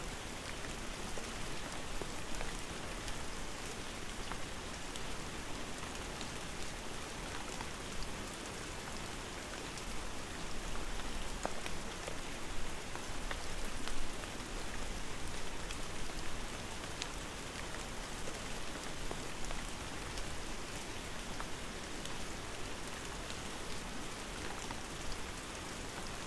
Rain Sounds, Light Rain; Night Rain Sounds

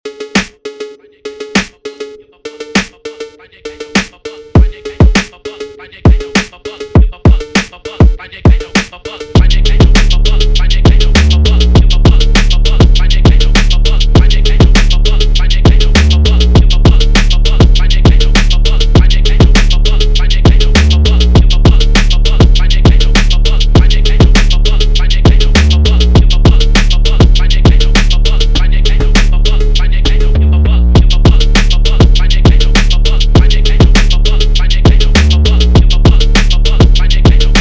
Phonk x Memphis Type Beat

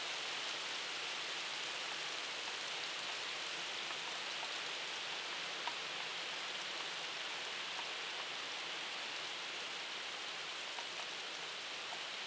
Rain in Rome

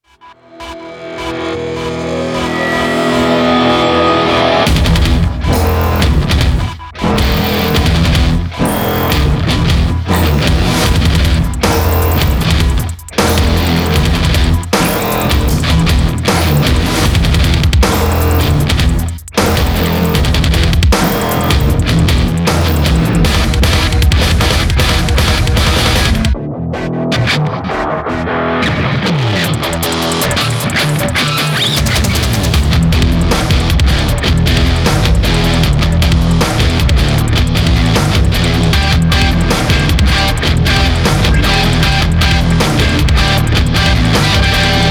Tension; UNDERscore Music Library; SuperMax Tension Injector

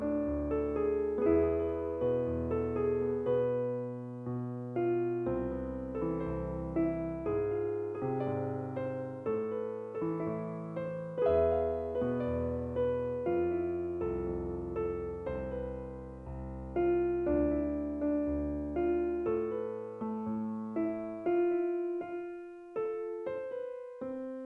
Relaxing Piano

